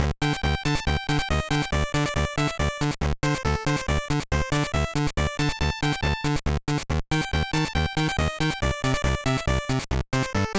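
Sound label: background music; No No No Puzzle Chailien; GBA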